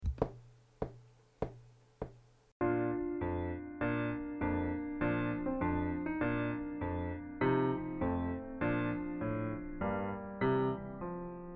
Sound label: Toreadors song playback